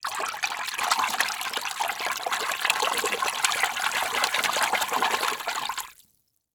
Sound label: Liquid Water Movement In Plastic Bucket Hands Splashing Constant Fast Short